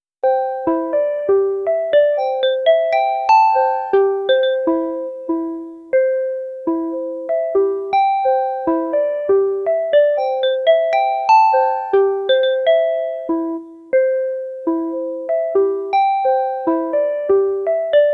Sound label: addictive exciting can be